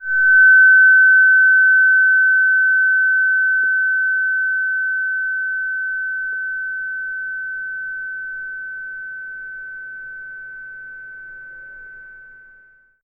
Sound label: fork Ceres